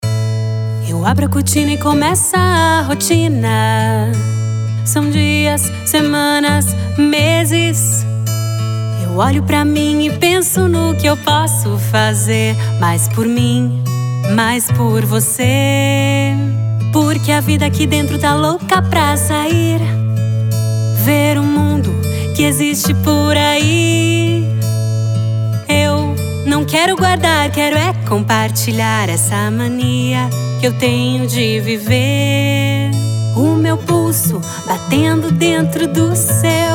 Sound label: jingle